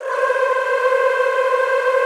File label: C4-R, Extended Classical Choir; Best Service